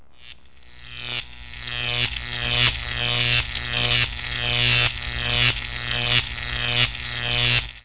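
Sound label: high voltage; Deliantra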